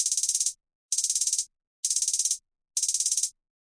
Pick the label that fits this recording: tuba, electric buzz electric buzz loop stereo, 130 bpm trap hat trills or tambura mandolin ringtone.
130 bpm trap hat trills